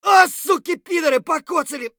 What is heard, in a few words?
hurt light; Escape from Tarkov; scav3